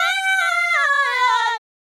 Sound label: Fem Vocal 128bpm G FX, African Voices